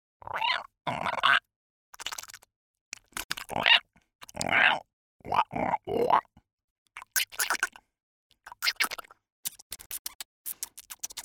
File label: Alien Creature; Raw